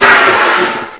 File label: rocket; HangSim; beach